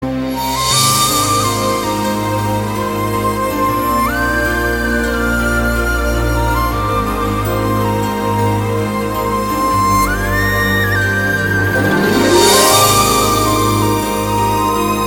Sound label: Instrumental Ringtone